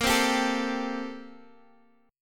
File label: A Minor 7th Bb; Am7/Bb; strummed; chordshape